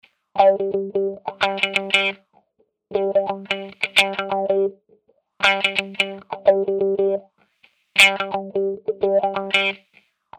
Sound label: WahWah Guitar